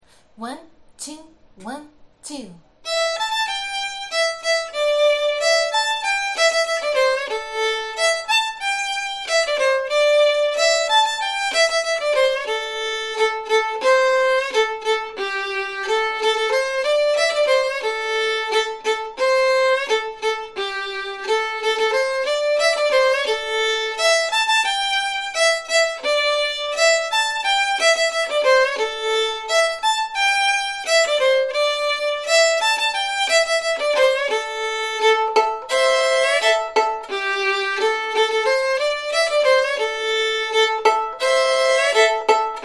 cluck old hen, fiddle

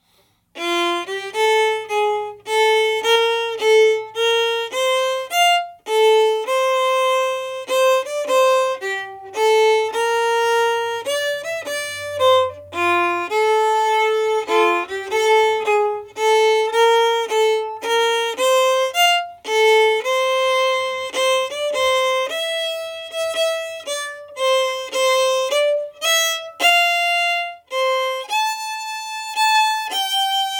waltz quadrille simple version, fiddle

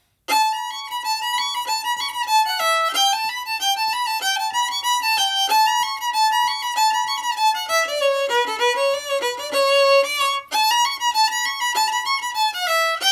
june apple harmony, fiddle

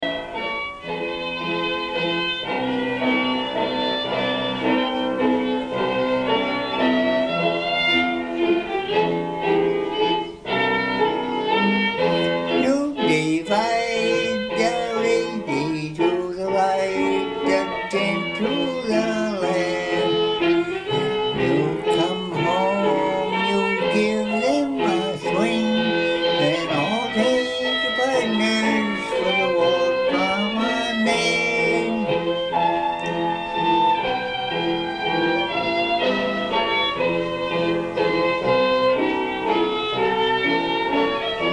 waltz quadrille, fiddle; clarence maher

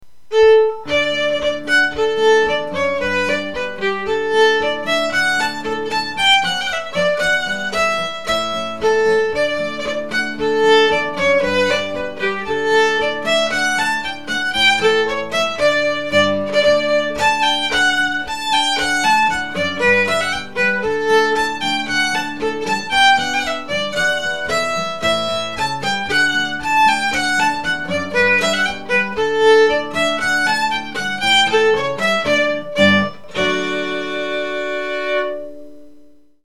meeting of the waters with guitar, fiddle

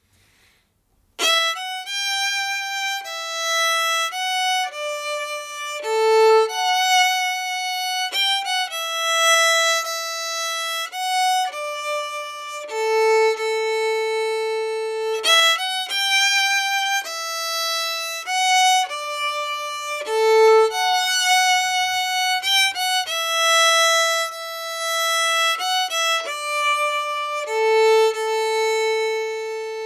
arran boat song countermelody, fiddle

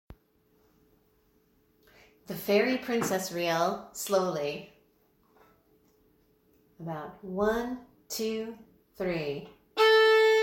fairy princess reel slowly, fiddle

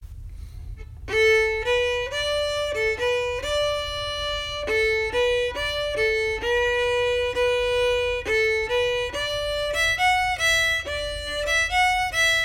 angeline high octave, fiddle; angeline the baker

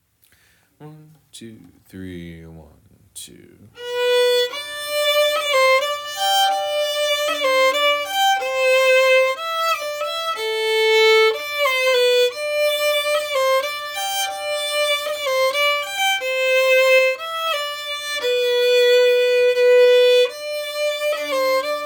harmony, fiddle

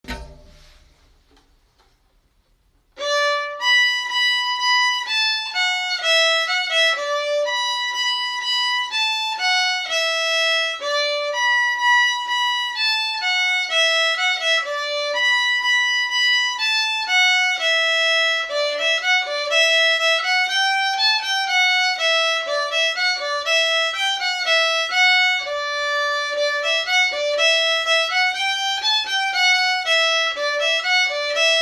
an dro bm harmony, fiddle

